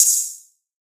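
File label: open hi-hat, Drums; One-Shots; HiHats; Ghosthack; Color Bass Essentials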